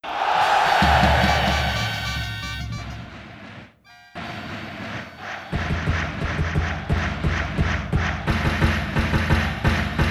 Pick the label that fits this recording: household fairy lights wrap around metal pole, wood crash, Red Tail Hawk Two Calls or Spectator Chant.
Spectator Chant